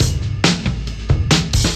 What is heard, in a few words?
Drum loops Funky